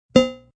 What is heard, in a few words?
Pong; Pings; multimedia